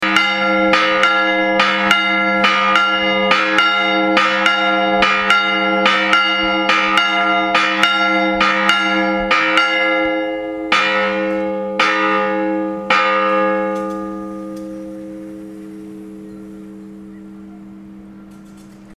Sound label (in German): Kirche